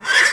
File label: Horse Hit Vox; Civ4 warlords